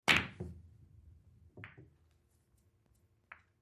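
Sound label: Pool Game Billiard Balls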